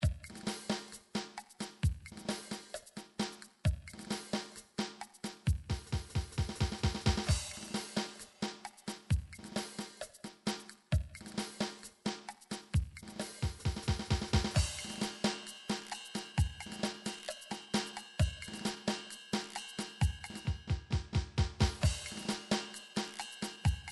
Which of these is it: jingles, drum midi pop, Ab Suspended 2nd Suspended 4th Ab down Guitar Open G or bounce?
drum midi pop